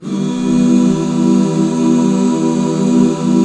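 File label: HAL E minor left, Back Chords; Sound & Vision - Gigapack II CD 1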